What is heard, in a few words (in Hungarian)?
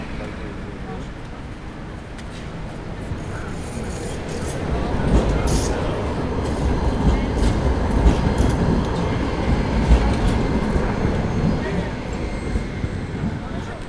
hetes villamos